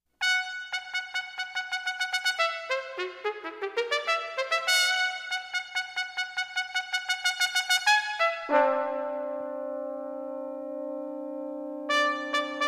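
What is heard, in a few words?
Miniatures for Brass Quartet IV Risoluto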